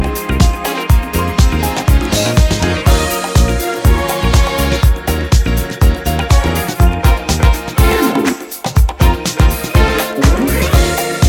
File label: Instrumental